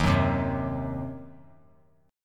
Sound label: Eb Minor 6th chord; strummed